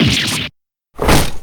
damage; Hugh

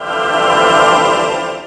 maaagical

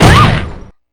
Aah!; p4u; Rise

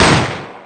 loud bang

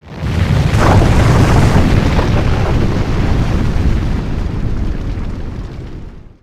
earth quake

